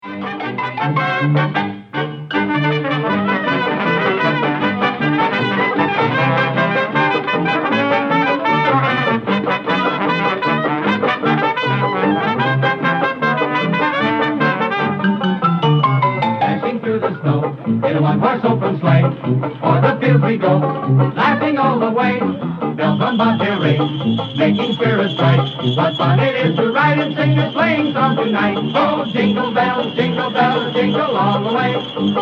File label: Jingle Bells; Special Ed Xmas